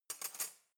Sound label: cutlery knife drawer place soft